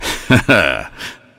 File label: superjump; the myst scout